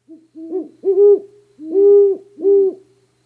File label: great horned owl